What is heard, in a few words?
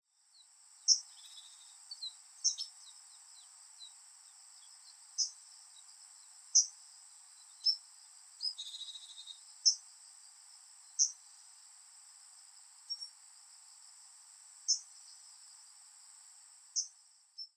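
Arctic Warbler; koe; nagasaki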